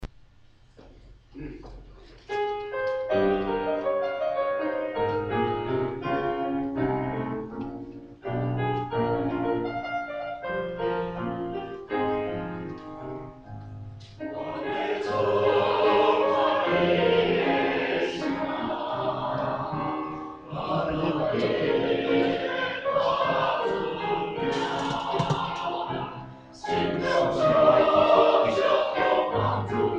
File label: Choir; taiwanese